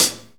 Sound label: HH PLAT; E-Lab; X Static Goldmine 2; akai